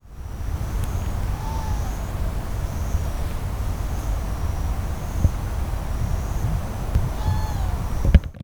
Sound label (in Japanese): オオコノハズク 地鳴き; 新潟県新潟市中央区 鳥屋野潟公園; bird song